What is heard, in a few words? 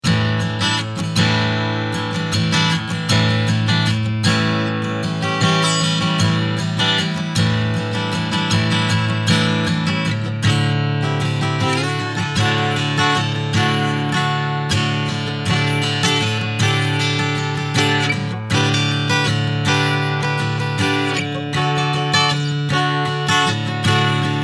Strumming AKG Mikro